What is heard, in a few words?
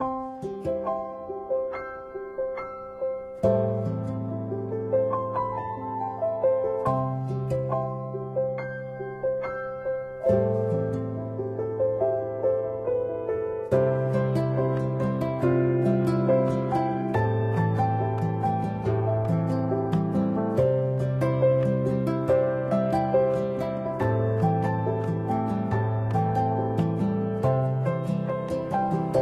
music valentine